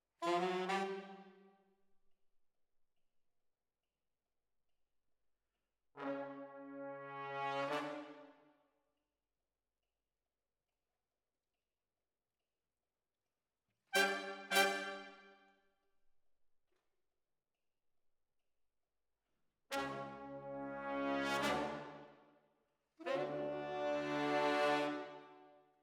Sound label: ORTF, Jazz for piano